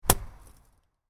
Snowball hit, Snowball, Impact Wall; Weather; Stuart Duffield

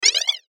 Transition Cartoon Blurp Hits; Multimedia; Blastwave

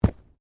Body movement Organic Impact Medium

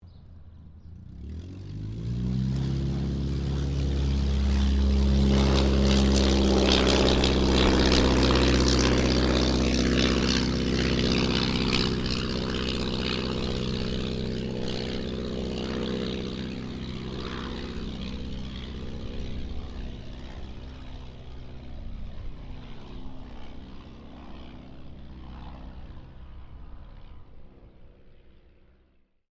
AIRCRAFT DH82A DEHAVILAND TIGERMOTH PASS

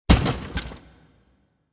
Bursting Wood Elements; Bursts; Impacts; Crafted Signals

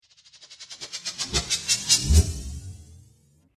Dance Riser

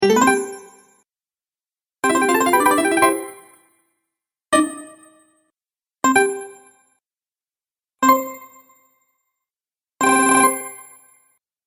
Game Sparkle Sounds Collection, video game

